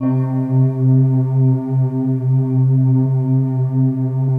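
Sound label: soft voices, Soft Voice Stack; Optical Media International; Sonic Images Library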